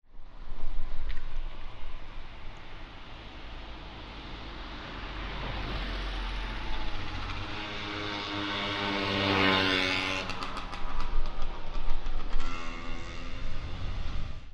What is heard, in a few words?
bike